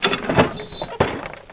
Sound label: warehouse; Caesar3